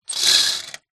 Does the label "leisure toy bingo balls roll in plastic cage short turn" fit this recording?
Yes